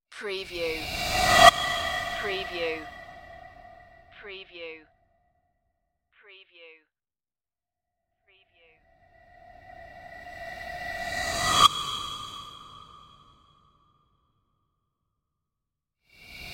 scary whooshes

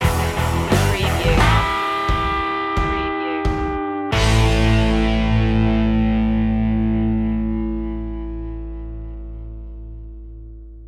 total trucker stinger

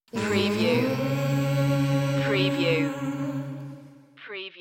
groove stinger

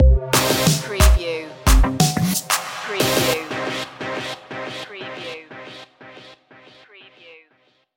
loaded stinger

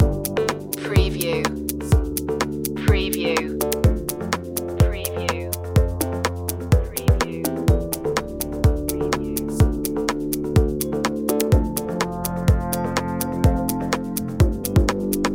beats loop, chill

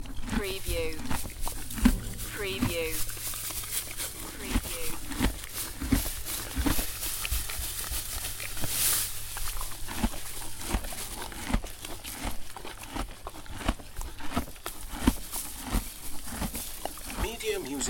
Horse eating munching hay

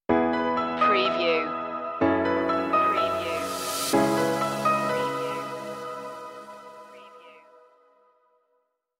steady progress stinger

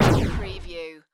Laser Gun Single Shot; Sound Effects Bible

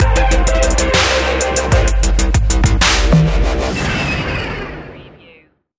dubstep stinger

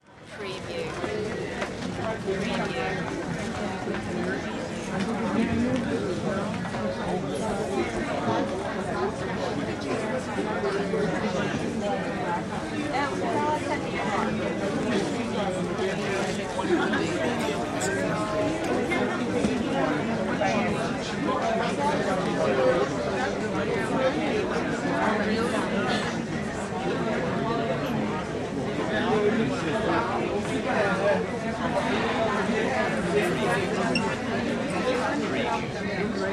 Crowd Walla Small Room

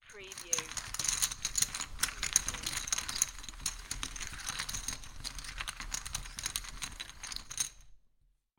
Poker Chips Rummaging Around On Table

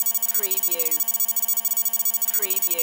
Digital Readout

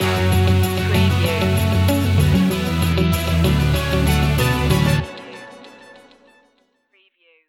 full throttle stinger

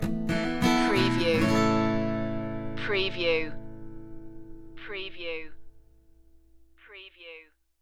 stinger

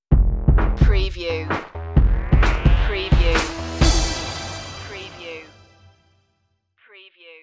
tv stinger

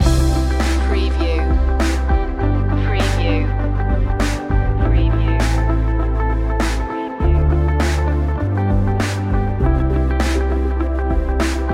step by step loop

